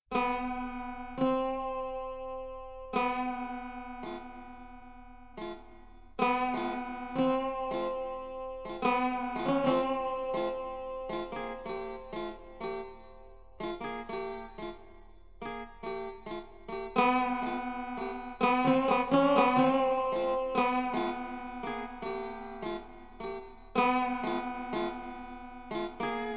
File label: Koto